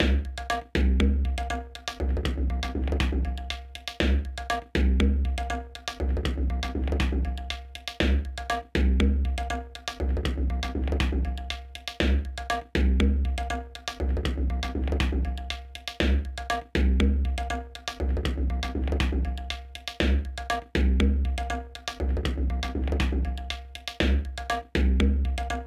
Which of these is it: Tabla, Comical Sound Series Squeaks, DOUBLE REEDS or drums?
Tabla